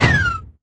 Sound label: dry fire